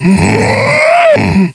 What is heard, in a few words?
Clot Death